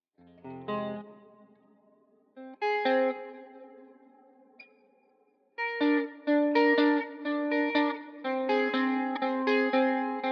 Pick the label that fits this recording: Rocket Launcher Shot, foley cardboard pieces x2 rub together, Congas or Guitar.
Guitar